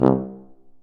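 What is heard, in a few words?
brass C tuba, staccato; Solo Brass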